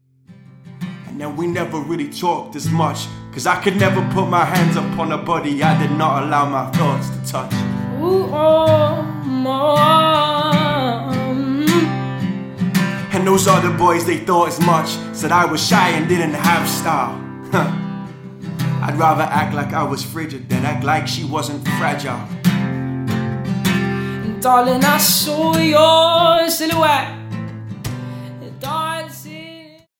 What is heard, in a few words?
red roses acoustic